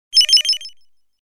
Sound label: Swamp animal